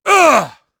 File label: attack voice; UFC Tapout; XBOX